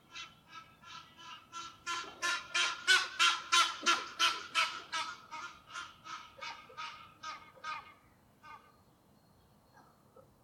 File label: Egyptian Goose Alopochen aegyptiaca